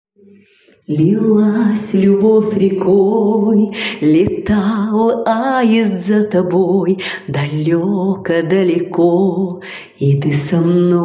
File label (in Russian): Колыбельная детям; Святые места города Красноярска; Колыбельные от наших мам